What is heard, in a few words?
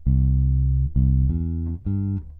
Blues C Bass One Bar 100bpm; Blues C Bass 2 One B